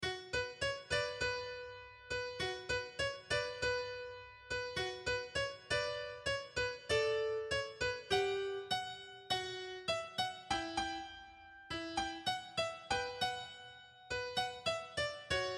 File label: bella ciao violon facile